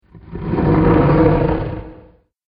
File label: Secret Bookcase Door; Miscellaneous Door Sound Effects